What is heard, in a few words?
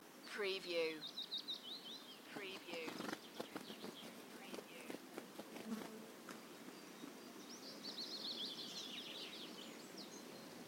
Nature Ambience with Bird Flapping; beanstalk audio